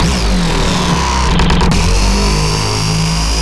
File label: 140 A BASSLINE, Dubstep; Loopmasters; The New Sound Of Dubstep; BASS LOOPS